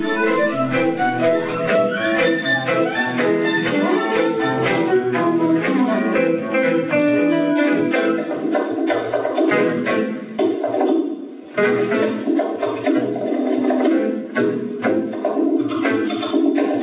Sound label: drums